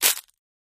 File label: Plastic Crush Shrt